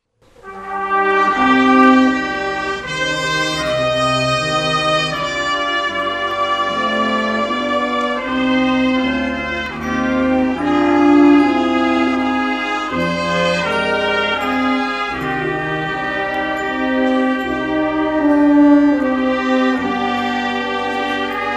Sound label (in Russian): Дух. оркестр Музыка; Общение Зеленодольск - 15-16 сентября 2007; Господь пребудь Ты с нами